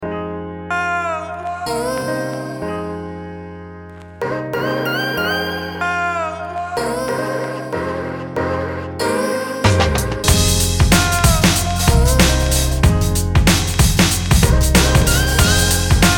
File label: Hip hop